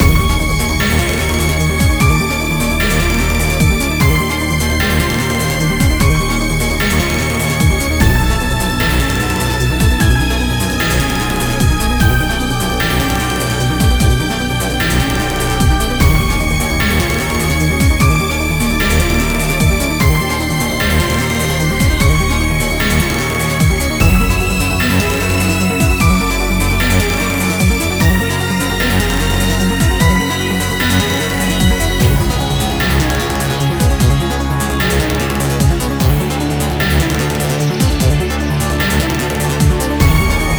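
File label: BGM Tension Loop; Azure Striker Gunvolt Striker Pack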